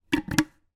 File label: household plastic lid place on glass jar